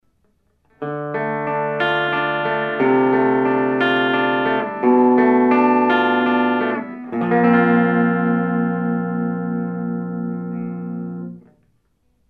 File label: primitive arpeggio